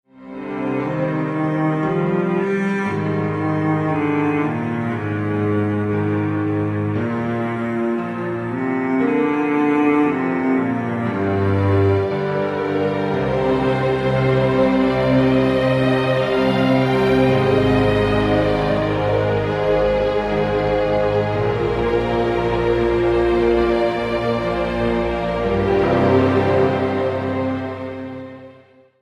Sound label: sad romantic tone; ringtones